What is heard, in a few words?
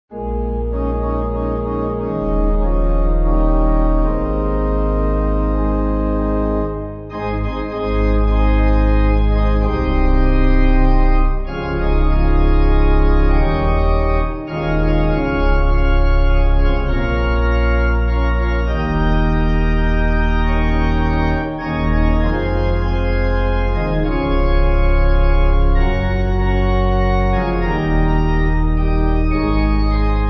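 Pipe, Pipe Organ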